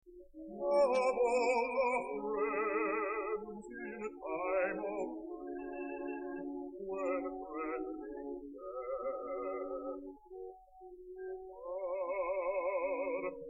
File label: air